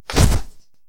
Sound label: attack succubus